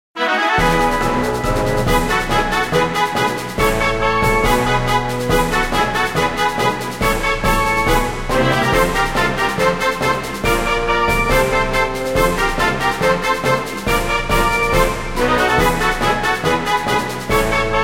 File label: Field Victory; Mons Awaken